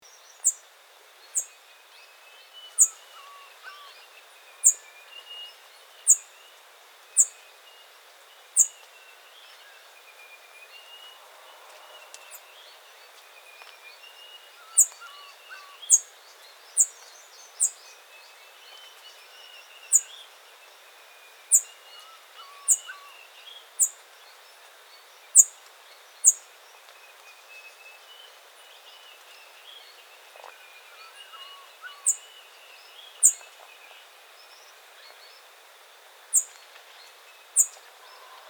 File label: pale headed brush finch